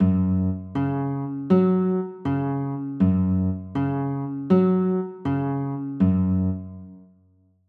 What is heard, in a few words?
f sharp 5, chords